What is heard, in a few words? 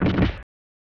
Hit